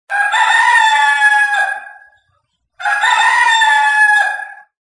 Rooster Crow Sounds Car Horn; South Carolina Gamecocks

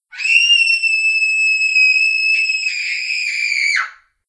Small Child Screaming Halloween Sounds Car Horn

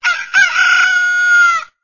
Rooster Crowing Sounds Car Horn

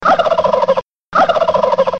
Turkey Gobble Sounds Car Horn

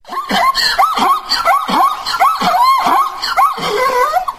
Zebra Sounds Car Horn

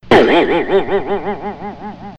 Cartoon Warp Boing Sounds Car Horn

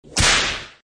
Whip Cracking Sounds Car Horn

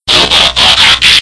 Transformers Transforming Sounds Car Horn

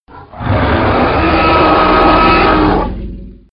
T-Rex Roar Sounds Car Horn

